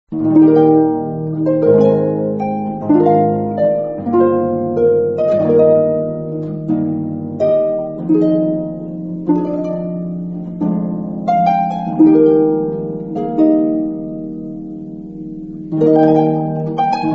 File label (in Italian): intervallo